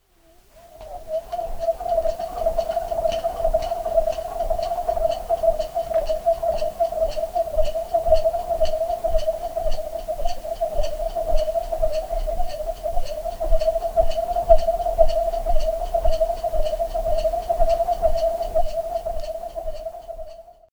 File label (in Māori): Poi Awhiowhio; Te Ao o Taonga Puoro